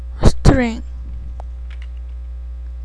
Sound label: string